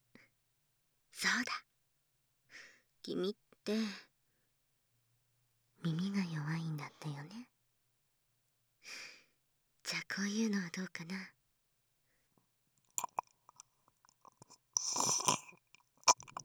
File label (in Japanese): れろれろ耳舐め; SE音無